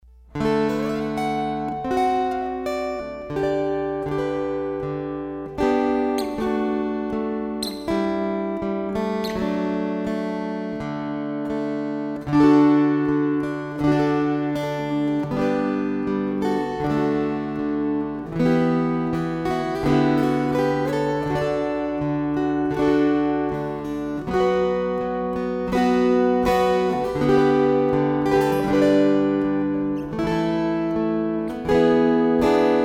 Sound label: String Choir